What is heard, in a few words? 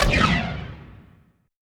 FX HIT, Techno Trance; Ueberschall